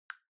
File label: Smartphone Typing Speaker Single